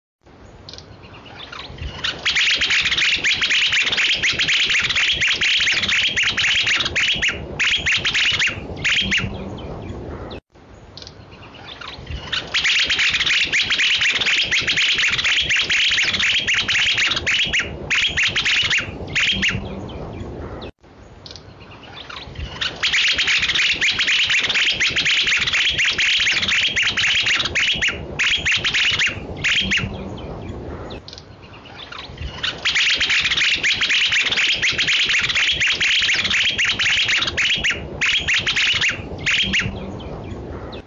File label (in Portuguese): canto do alegrinho balanca rabo